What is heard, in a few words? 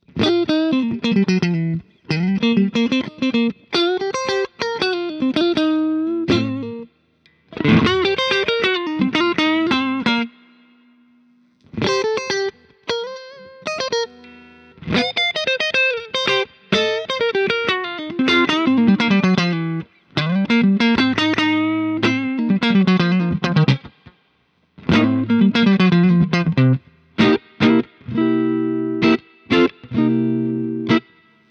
Kemper Strat Test